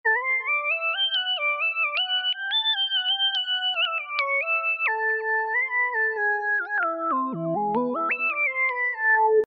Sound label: Lead Of Six Sines, Alesis Micron; Factory Programs